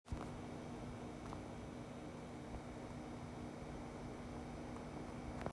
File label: Gm712 eco